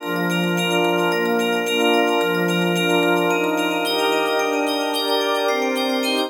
noises outside; adarkroom

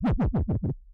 death; adarkroom

